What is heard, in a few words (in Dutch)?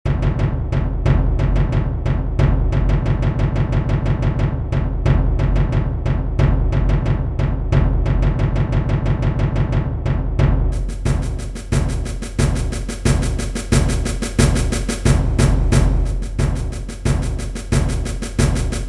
crescendo, Trommelkorps; Chirojongens Wij Koersel